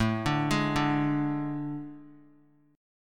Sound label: A Major 7th Suspended 4th; AM7sus4; arpeggiated; JGuitar